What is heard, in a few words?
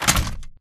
Damaged Torso scrape Concrete